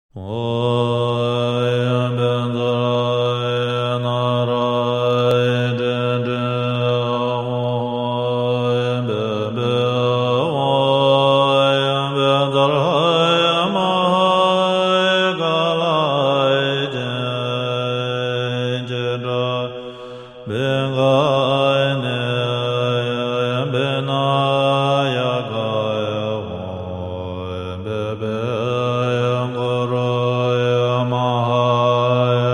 Tibetian Chants Buddhist Meditation, Mantra Of Mahakala